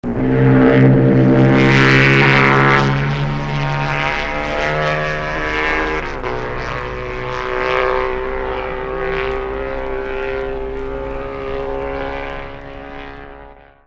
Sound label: Bianci Twin